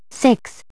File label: Call Six, phonetones EffectSnd; LG; CU405